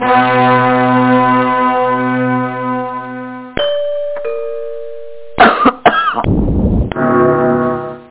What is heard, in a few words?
trum